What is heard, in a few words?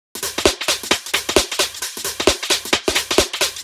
Beat Mod 132bpm, uk garage